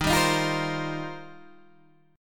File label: D sharp 6th Add 9th, Guitar, DADGAD